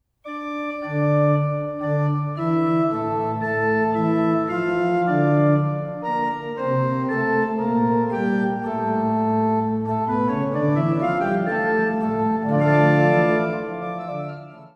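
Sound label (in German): Andante Concerto D Moll, Orgel; Johann Sebastian Bach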